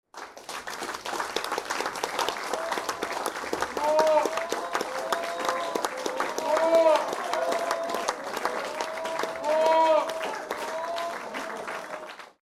Applause and shouts for more Small Interior Crowd; Applause Sound Effects

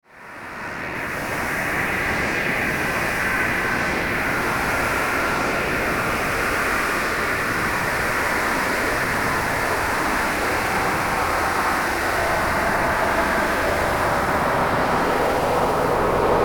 Bed Short Eerie Flange Static; Bed Sound Effects; Blastwave; Production Element Imaging Element Accent Transition

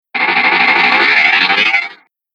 Booster Laser Motion; Sci-Fi Sound Effect; Laser Sound Sound Effects; Andrew Fleming

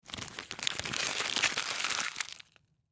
Lint Roller Crumple Sheet; Crinkling; Foley

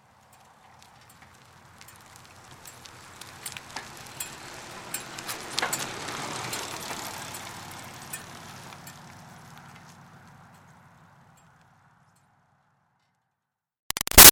Bicycle Retro Cruiser By Slow Pedaling; Bicycles; Vehicles; Big Room Sound

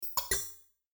Pixie Dust Filter Shimmer Hits Cartoon; Magic; Blastwave

